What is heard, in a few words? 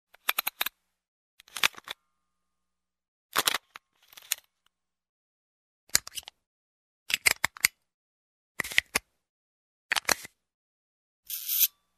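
winding a mechanism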